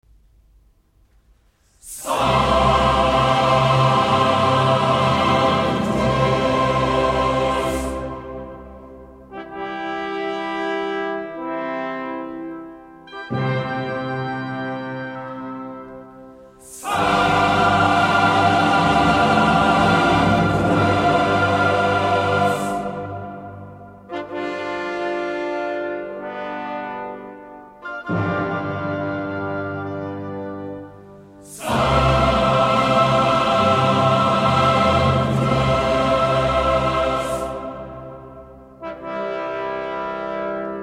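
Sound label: Missa in C minor - Sanctus, Choral; London Symphony Orchestra; Mozart - Great Choral Works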